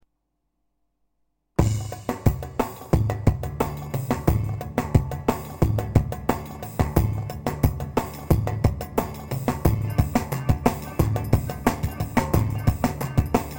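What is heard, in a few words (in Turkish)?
pa 500 tr ciftetelli, korg; KARIŞIK RİTİMLER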